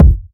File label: smooth kick drum G key